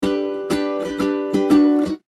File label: instrument nukulele rhythm